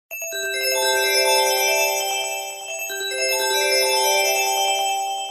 Chimes Sony Ericson

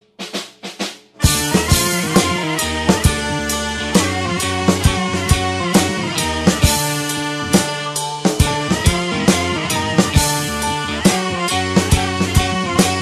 Zurna; Cukur

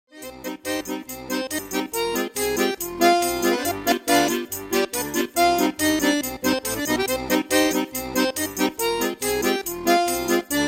Accordion ringtone

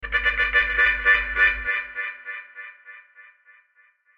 Harmonica Echo Sms

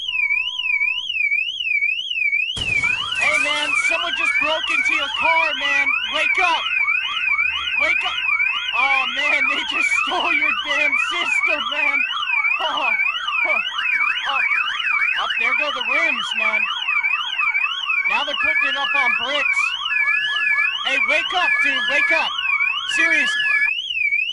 Car Break In alarm

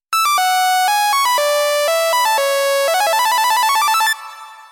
Nokia Tune

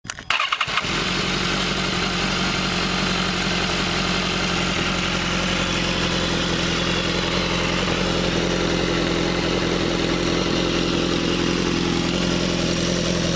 Bmw S 1000rr

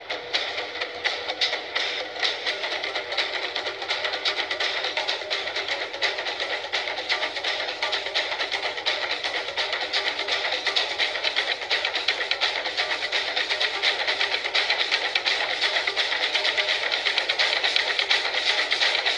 drums; Jumanji

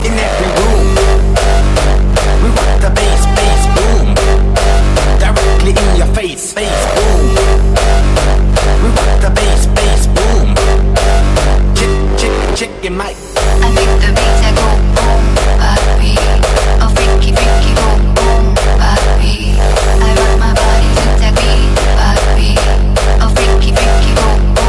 Bass Boom

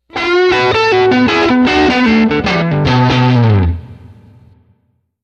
Electric Blues

